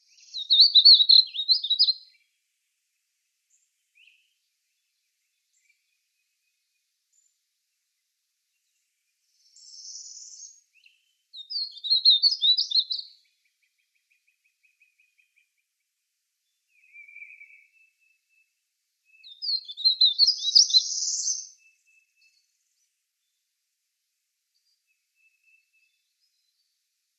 Painted Bunting song

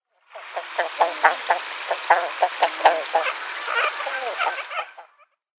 King Eider female

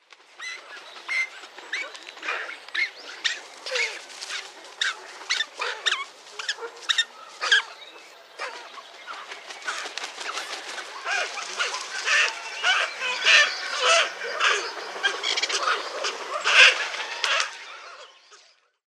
Sacred Ibis calls colony

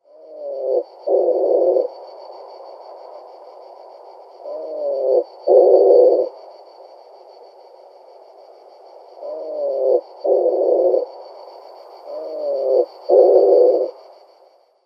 Wood Pigeon calls

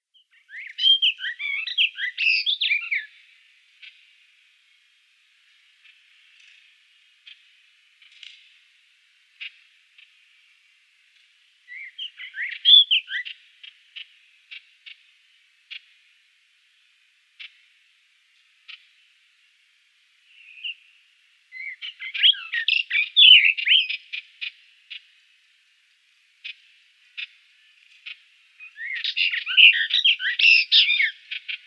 Arabian Warbler song

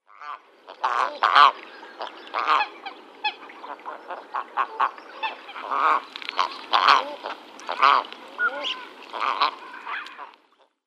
Common Eider female

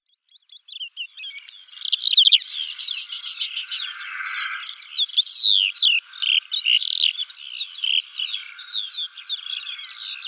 Black Lark song

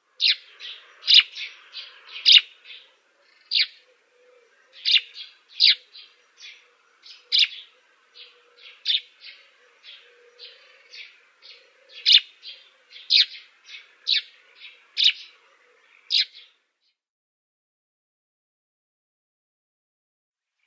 Dead Sea Sparrow calls

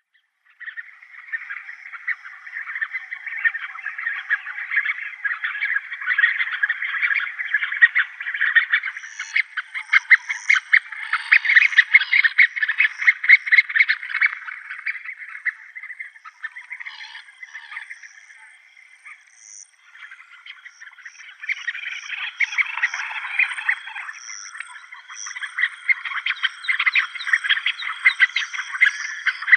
Spur-winged Goose

